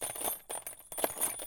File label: Metal Armor Sound